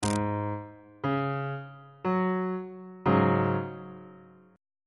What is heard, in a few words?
d flat suspended 4th 2nd inversion on bass clef